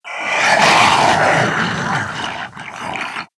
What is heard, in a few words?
attack, zomb female; nmr